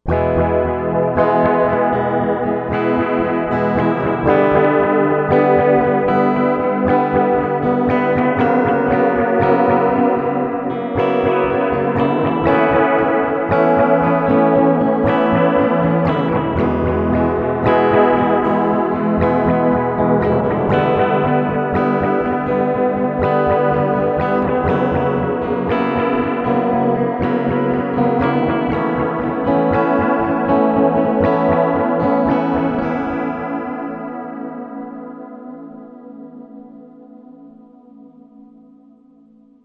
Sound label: Chorus and Delay at 12 o clock